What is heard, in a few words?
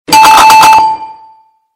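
game show correct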